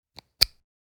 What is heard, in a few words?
Speaker connector adapter unplug; headphones and speakers